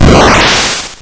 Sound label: die; marioball